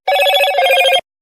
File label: Motorola Moto G22 Sms Ringtone